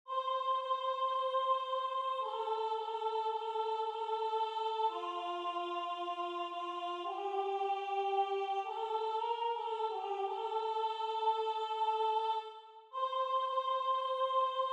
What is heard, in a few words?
Soprano; DER LINDENBAUM